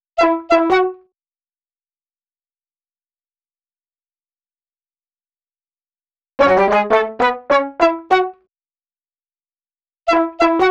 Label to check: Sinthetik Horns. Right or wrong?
Right